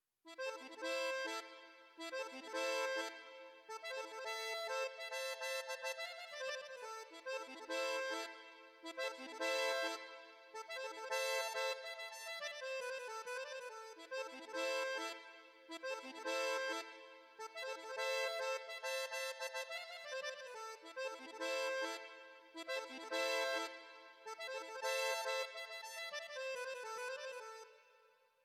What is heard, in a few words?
music interactive; World of Tanks